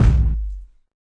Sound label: grungy kick; Hip Hop Specialty Kit; STYLE KITS (Hip Hop, Etc)